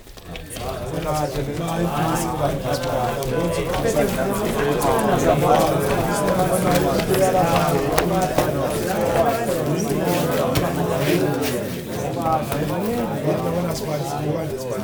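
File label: AFRICAN CROWD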